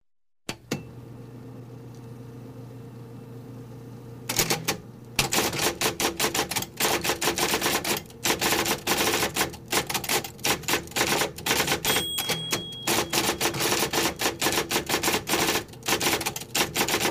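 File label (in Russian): пишущая машинка электрическая быстрая скорость печати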